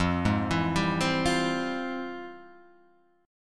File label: F Minor Major 9th, chord; FmM9 arpeggiated; JGuitar